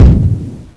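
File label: EXPLOSION SMALL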